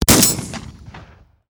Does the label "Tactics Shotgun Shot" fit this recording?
Yes